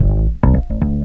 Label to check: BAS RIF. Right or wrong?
Right